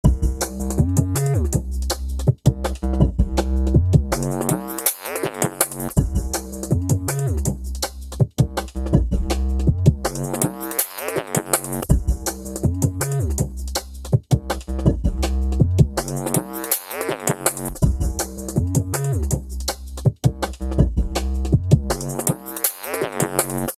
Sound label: junglist filter; hifi; Logic 5